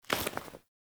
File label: Female Foley Action Default Melee Heavy Swipe, Default Clothing; Characters; Player